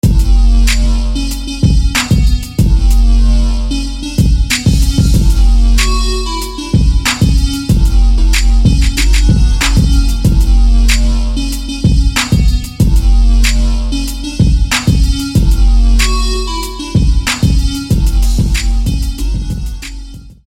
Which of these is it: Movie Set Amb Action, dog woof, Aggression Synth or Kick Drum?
Aggression Synth